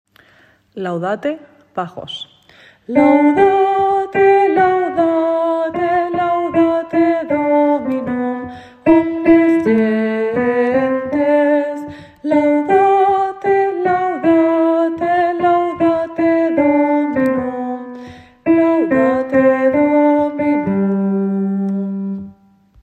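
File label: bass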